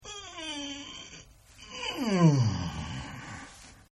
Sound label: Groan Male; Groaning; Voice